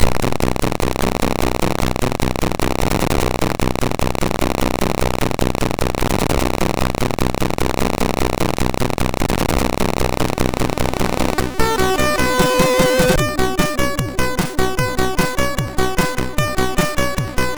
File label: Koto Cover, MOS6581R2